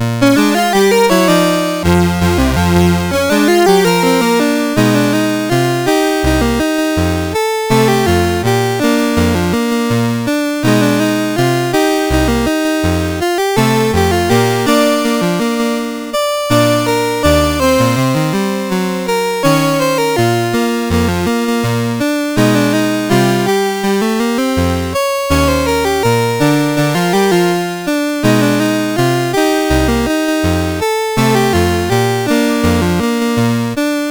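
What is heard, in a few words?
MOS 8580 SID chip; CSG8580R5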